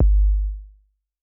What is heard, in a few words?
C#2 Pure Tuned Kick, Tuned Sub Kicks; One Shots; Touch Loops; ElementsOne; Abstract Rhythms